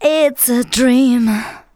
VOCALS SUNG; Techno Trance Essentials

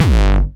909 Grime Layer G1, Kicks; Mbase 11 Kicks

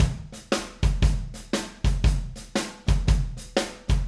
Acoustic Live Drum

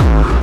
hard bass drumz

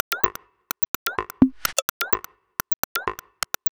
Minimal Funk, 127 BPM, Percussion; Sonic Foundry (Sony Creative Software); Underground UK House & Electro

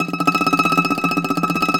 BZ TREM E 5, BOUZ.TREM, Mediteranean Traditions

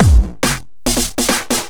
Loops Fills

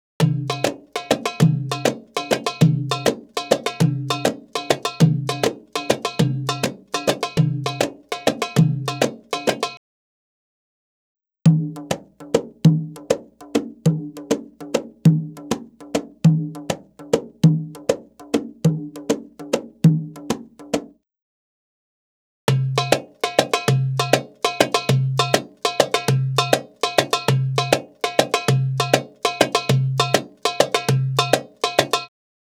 Arabic Rhythms

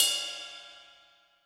ride, Zoom MRT-3B

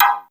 El percu, Yamaha Motif ES, electronic; electronic & zap; Yamaha Motif ES-Series

